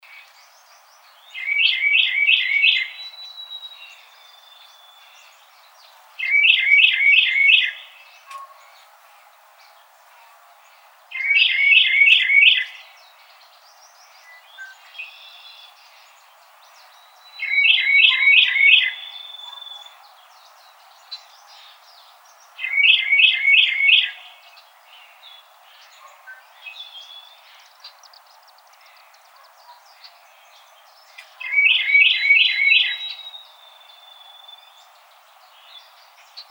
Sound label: Carolina Wren